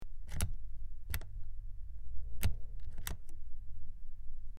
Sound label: Large Button Press In, switch clicks